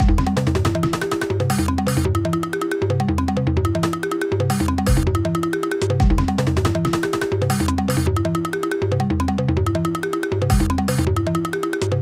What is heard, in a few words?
Ethnova